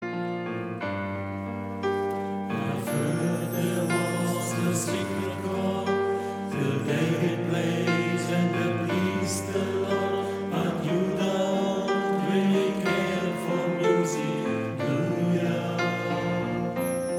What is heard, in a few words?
Halleluja